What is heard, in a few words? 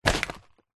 pet mouse rodent food bag pick up; animals